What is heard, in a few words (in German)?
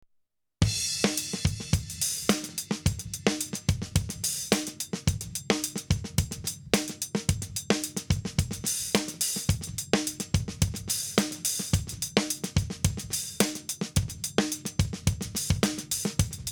Studio Comp Schlagzeug 3:1 -10 dB GR Easy; Behr Studio Comp